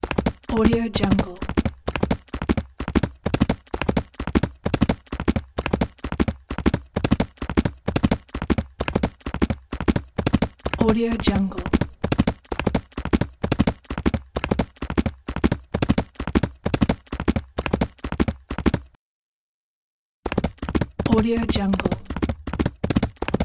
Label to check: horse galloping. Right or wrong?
Right